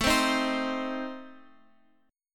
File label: A Diminished, Guitar, Open F, Adim chord; strummed; x,x,9,7,6,7; JGuitar